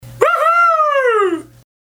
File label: whoohoo